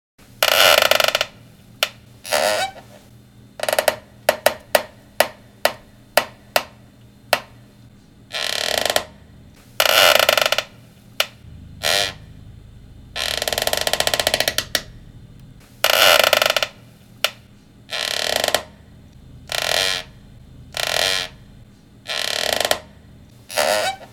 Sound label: Creaking chair